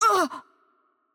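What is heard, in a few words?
Brazilian Portuguese HurtLight; VO; bloom minigame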